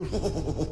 risinho

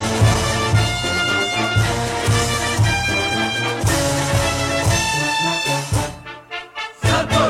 trombeta; santos fc

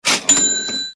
efeito caixa registradora

